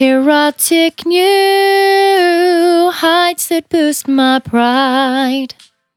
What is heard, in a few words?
Finally 70bpm C, Velvet Vocal; Zero-G